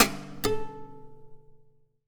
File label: Classical Guitar Double Note Plink; Classical Guitar Extended Technique Stereo